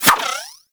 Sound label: Skip Rope Whoosh; Warframe; Characters; Pets; Moa; Tenacious